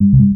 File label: Notification